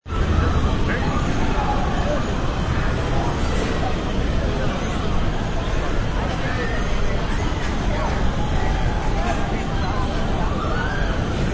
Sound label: Amsmnt Prk, Background sounds of crowds and games at amusement parks.